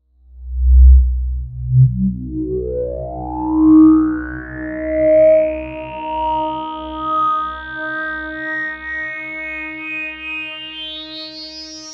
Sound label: Roland Jupiter6 Beautiful Overtones